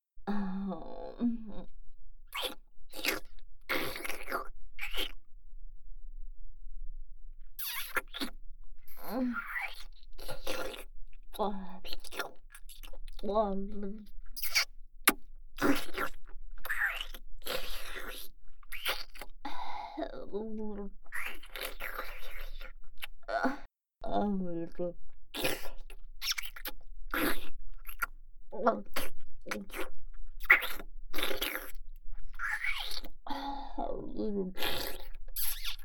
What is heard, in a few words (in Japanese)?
フェラ音ループ, ASMR; 触手音 フェラ音 耳舐め音オンリートラック